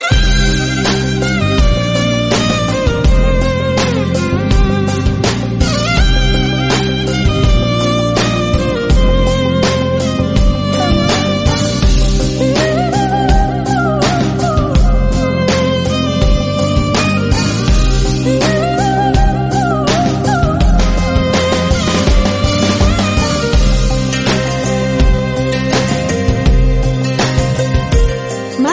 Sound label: fantastic tone